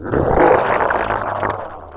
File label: attack, statue